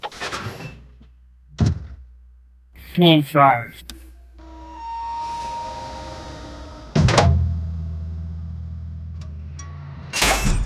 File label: car, bass rumble; transformers style car